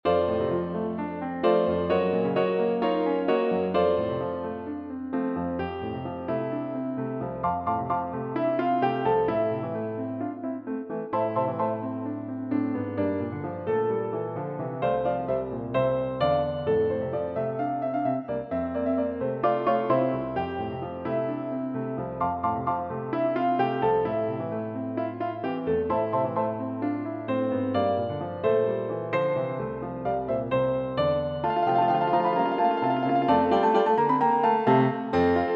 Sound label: Piano Four-hands